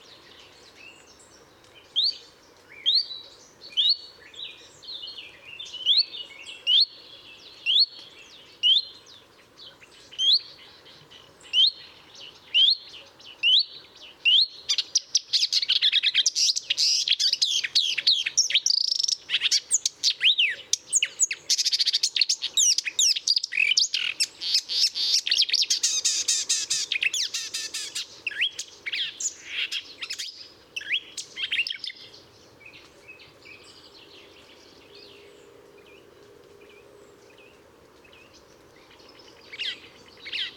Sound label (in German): Sumpfrohrsaenger; Murnauer Moos; Michle Peron